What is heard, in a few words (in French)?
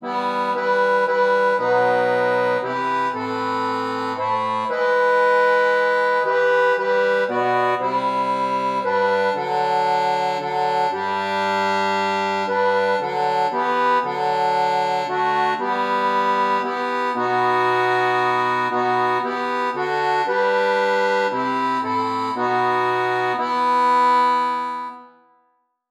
ACCORDEON; ALLELUIA de Schutz